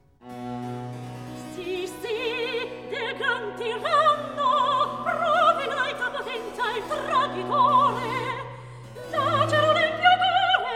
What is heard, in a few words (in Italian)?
Recitativo; Agrippina condotta a morire HWV 110; Si, si, del gran tiranno; Handel Masterworks; Klassika